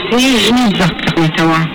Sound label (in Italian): e risa; e risa qui già va!